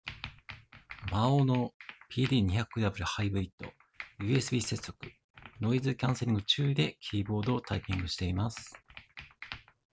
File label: maono pd200w hybrid usb typing sound